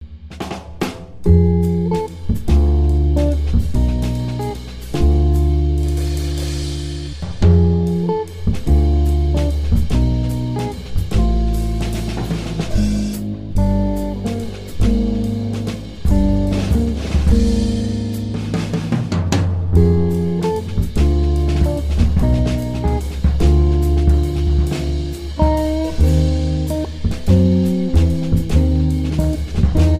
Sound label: Blues in D; Art of the Blues